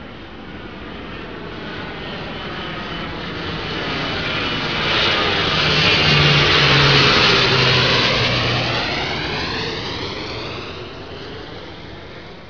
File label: Slow jet